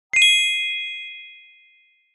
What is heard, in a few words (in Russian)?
dotronulas volsh palochkoi